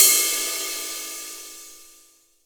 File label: 22INCH ZIL RIDE, Ride Cymbal